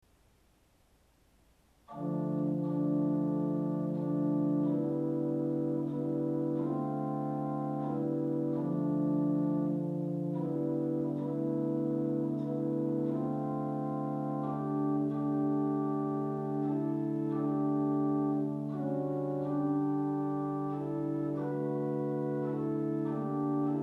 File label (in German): Hohlfl 8, Orgelstimmen